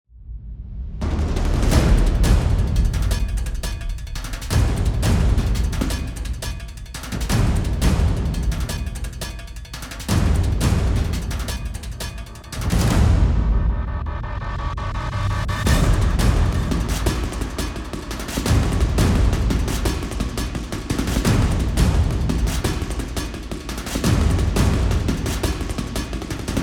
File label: drums